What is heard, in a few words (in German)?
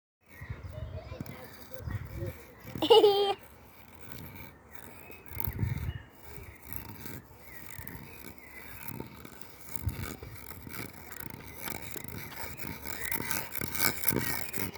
Stockmusik